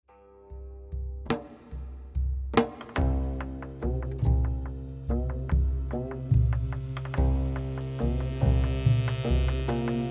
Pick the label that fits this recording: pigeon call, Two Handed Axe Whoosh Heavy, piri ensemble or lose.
piri ensemble